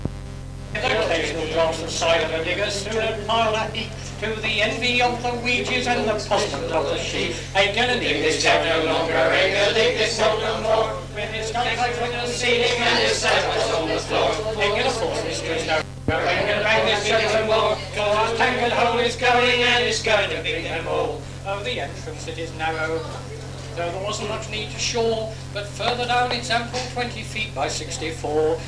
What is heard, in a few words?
Choir